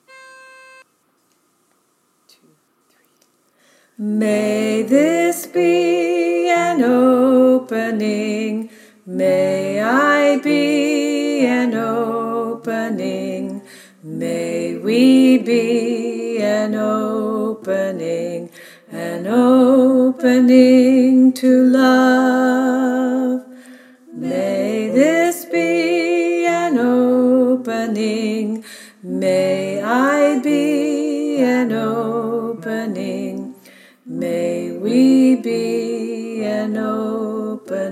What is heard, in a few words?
tenor; The Freedom Choir